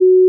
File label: G flat 4, pure tones